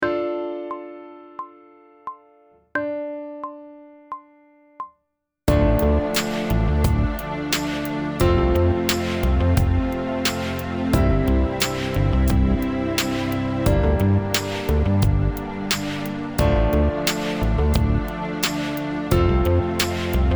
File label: Sight Singing backing track, pop vocals; Grade 4